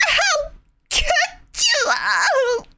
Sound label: dying; birdo; SM64 CO-OP